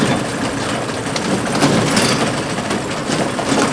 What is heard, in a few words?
elevator, industry; AKAI S-Series CD-ROM Sound Library VOL-6